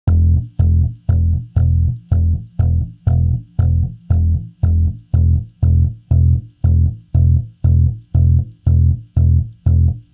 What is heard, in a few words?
new eve rythem groove